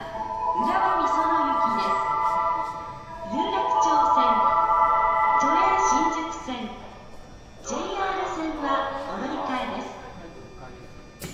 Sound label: vvvf, mitsubishi; tokyo9605